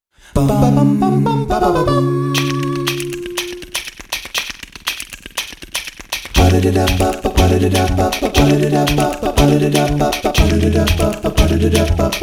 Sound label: acapella; ocarina of time